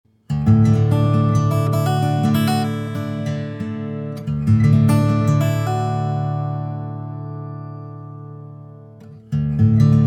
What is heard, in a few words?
Guitar